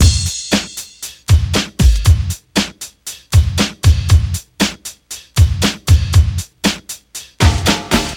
117 bpm hip hop drum beat f key, kick tuned to the F note